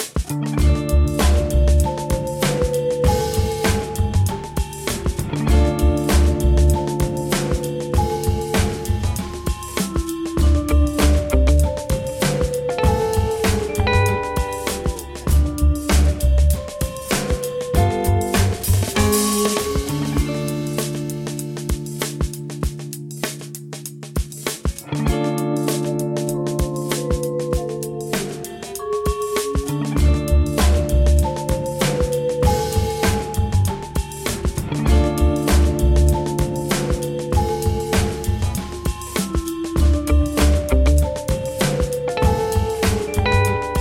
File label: Lounge Jam